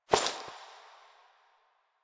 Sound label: Body Move